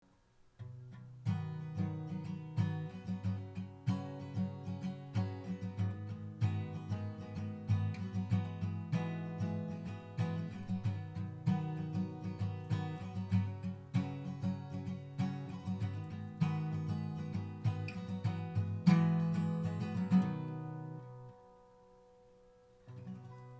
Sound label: Yamaha F310, guitar